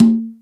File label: Lo conga, Roland MC-303, Percussion